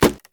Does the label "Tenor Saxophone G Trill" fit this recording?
No